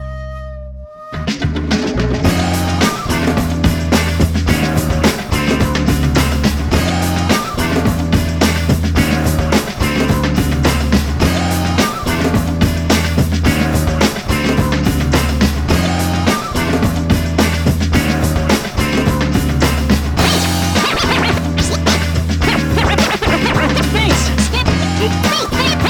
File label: various scratches